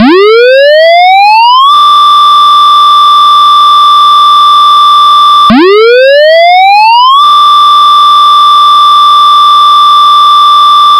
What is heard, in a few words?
Motor Siren - slow rise to 1200Hz; SmartVOX